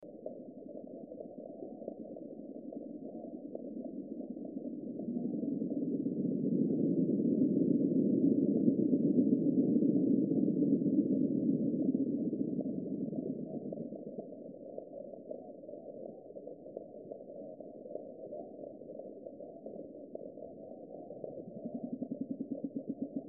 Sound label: roar drums